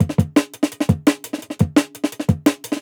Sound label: Break Full Drums, Jungle Breaks; Cool; Original Jungle Breaks